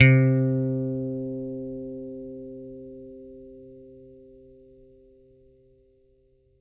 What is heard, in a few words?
PICK C3, Bass; Good 80 Pick; Bass Mace; DirectWave